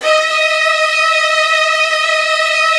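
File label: violin trills D#2; ILIO; Kirk Hunter Virtuoso Strings VOL-1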